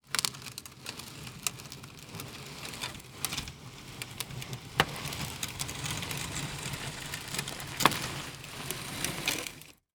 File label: Industry Crate Pull Slow